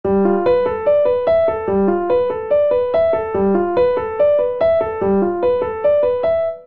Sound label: Ostinato Modal G Mixolydian 72 bpm, Grand Piano; MIDI Clips; Ableton